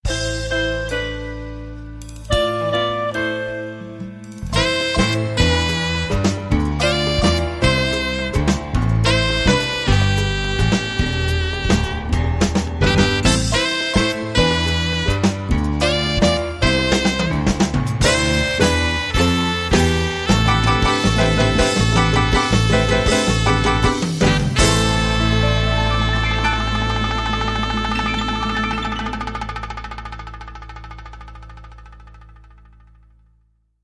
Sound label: Latin Dream